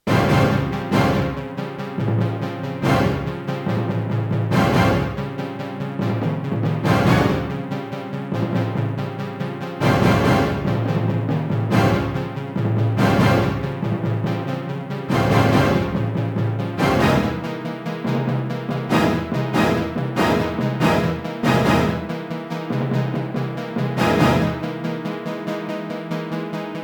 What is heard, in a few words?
Gravis Ultrasound MAX 16 hardware; soundcardrecordings; Abuse